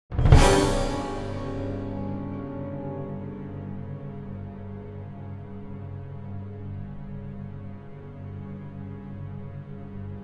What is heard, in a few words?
Dies music; Baxter